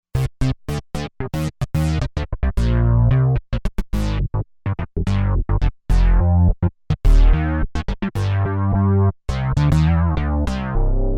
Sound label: Bass Pulse Bass